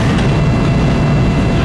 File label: Door Large Metal Loop; Doors